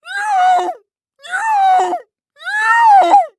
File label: Animal Bray; animals; AdobeCC